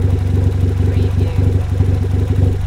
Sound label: Car Motor Loop Idle Engine Perspective